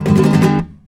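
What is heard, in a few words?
rasgueado A major, guitars, flamenco; Spectrasonics; Hans Zimmer Guitars VOL-2; FLAMENCO FX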